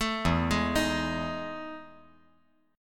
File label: E Major 11th down